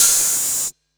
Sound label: Open HH, Cymbals